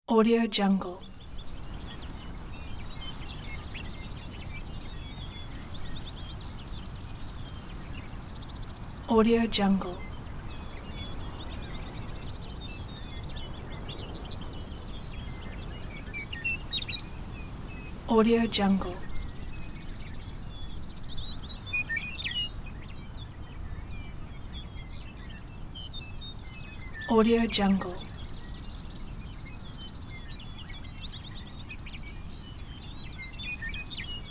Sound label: prairie ambience